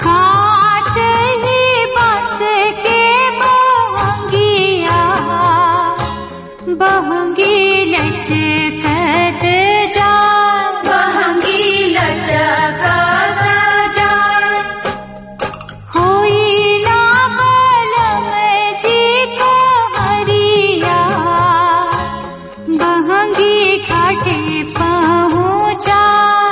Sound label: chhat song, ringtone